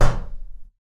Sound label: wood door knock